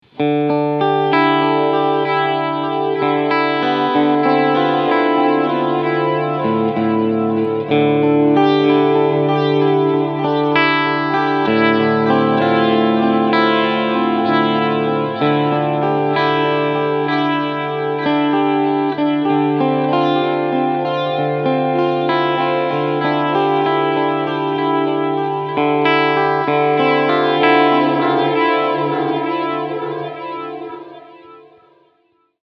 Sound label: Joyo Aquarius Galaxy